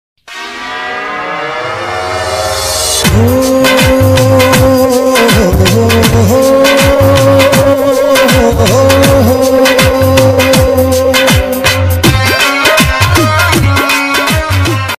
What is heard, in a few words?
new punjabi ringtone